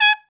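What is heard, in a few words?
BRA TRUMP, trumpet, brass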